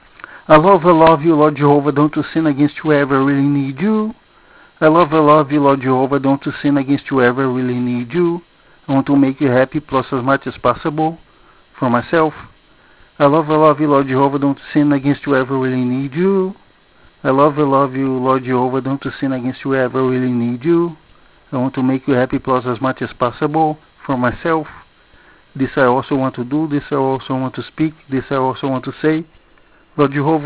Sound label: just voice